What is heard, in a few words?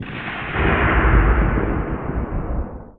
THUNDER; Best Service - XXL Production Effects